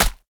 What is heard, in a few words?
tower demon Pit big Guy basic Attack